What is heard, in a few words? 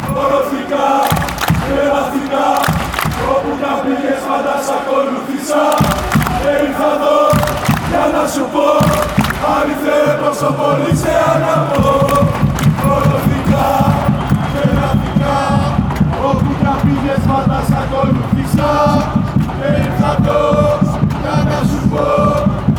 chant